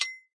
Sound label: balloon start; balloonsounds; There; ThereClient